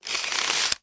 industrial tool tape measure retract short length

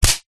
office ruler smack table; zapsplat

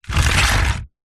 vehicles car battery slide on concrete ground

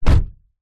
transport car door close internal toyota corolla

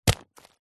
foley tissues small packet facial set down; zapsplat

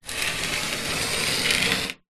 plastic small cap slide dusty dirty metal; foley

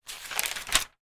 paper magazine page turn; sound effects one

